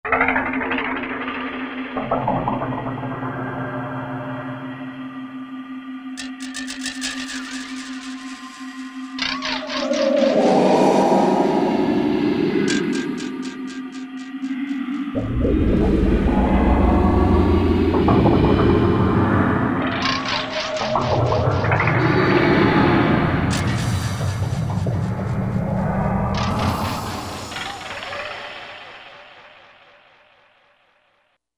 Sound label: Submarine; Korg MSC-14S Sound Effects